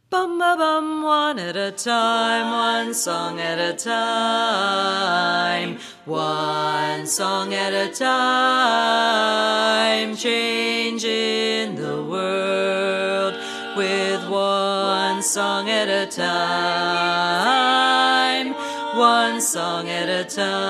Bass Dom

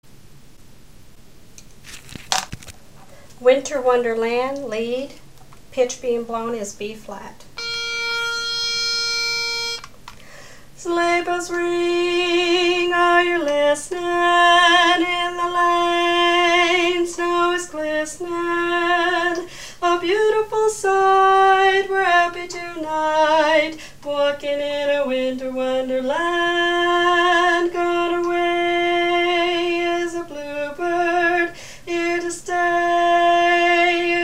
Winter Lead

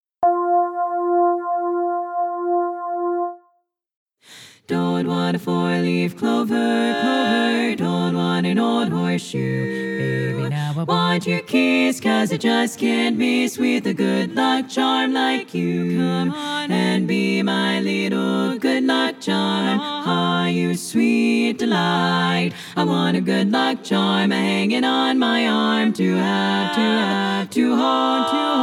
Bass

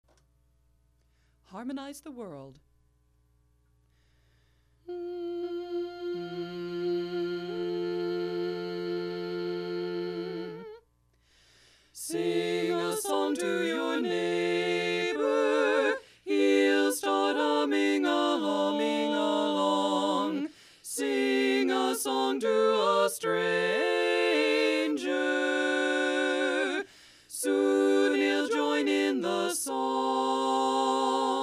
Harmonize bari miss; Har bari omit

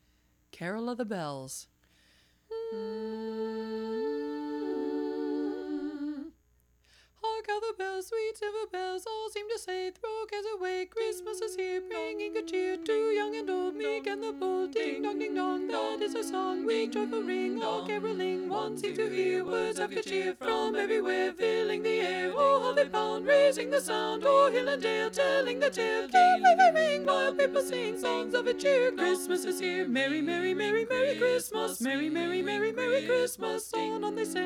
Carol Bells tenor dominant

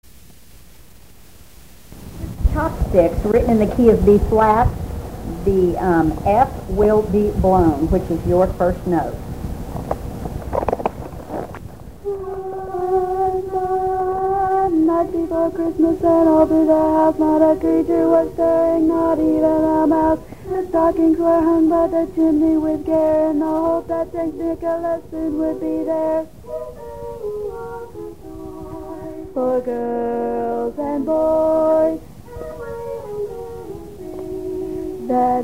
Chopstix Bari

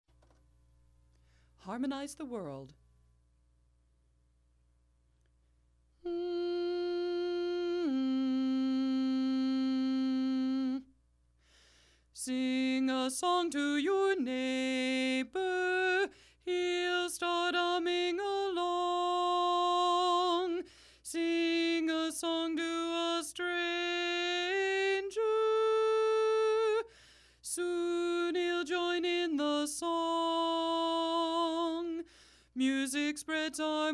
Harmonize bari alone

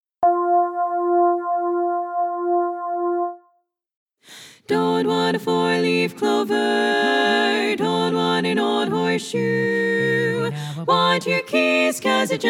Tenor

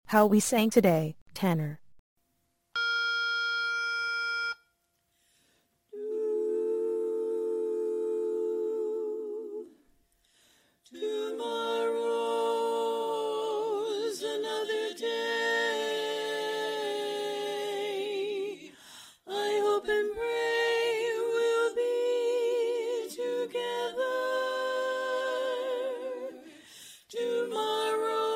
How We Sang Tenor Written

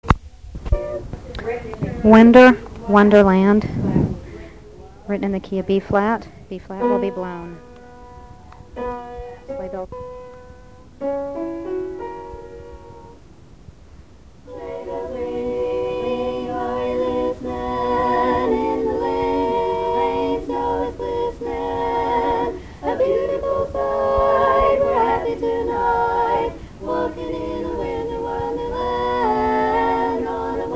Winter Tenor, chorus

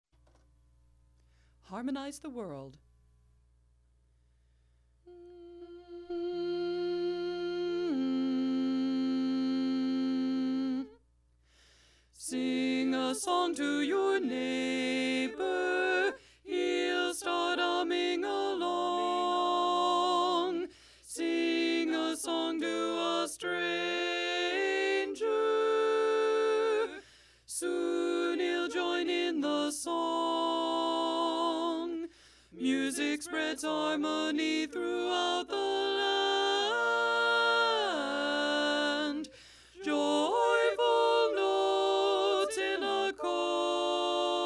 bari dom

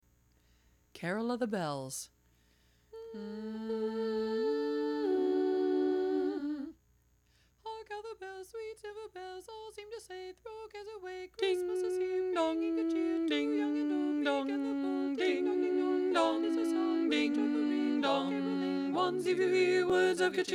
lead dominant; CarolBells

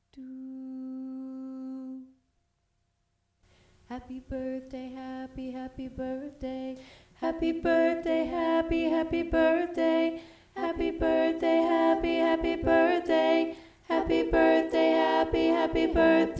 Happy Birthday Doo Wop Bari Dom

